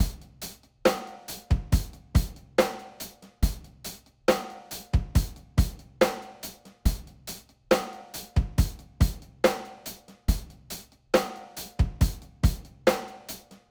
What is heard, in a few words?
rock